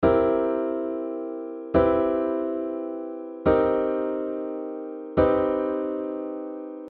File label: seventh chords